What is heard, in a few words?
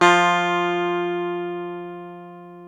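E 12 String guitar; Club-50 - Foundations Roland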